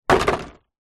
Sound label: household plant pot large plastic impact ground